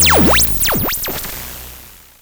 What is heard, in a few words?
Cwejman S1 Bass Twirl